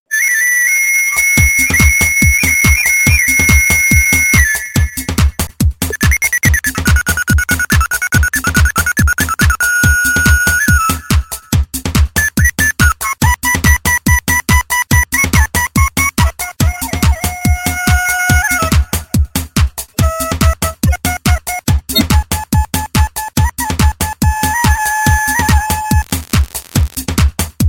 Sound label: flute with clapz